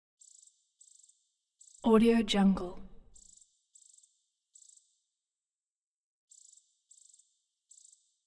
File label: bird song chippy dawn sparrow